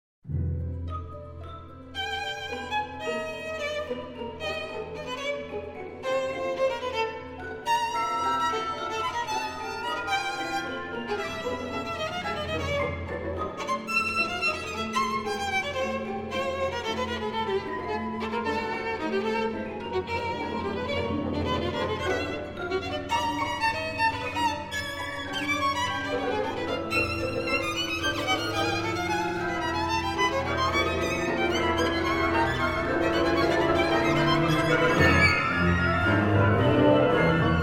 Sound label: VIOLIN CONCERTO